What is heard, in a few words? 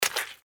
suicide splat, comedy cartoon, Körpergeräusche; soundpool